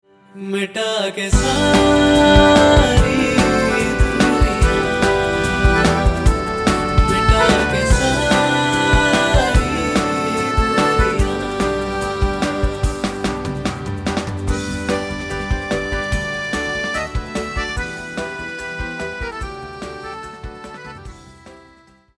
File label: WEDDING WISHING PEPPY SONG HINDI MALE